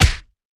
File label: move smash; keezers